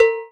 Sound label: Percussion, House